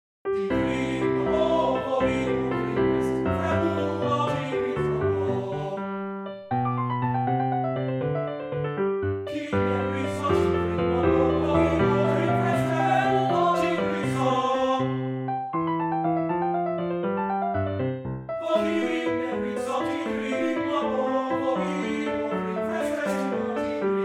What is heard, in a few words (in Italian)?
Lied per voce e pianoforte; Die Zufriedenheit